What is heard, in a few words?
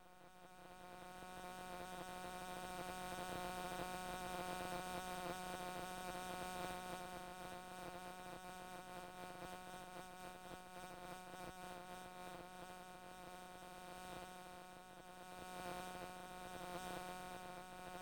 Eating A Fly; BBC Sound Effects Library